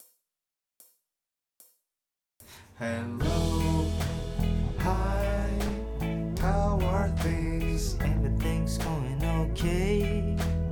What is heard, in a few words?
Greetings Song; Wordbeat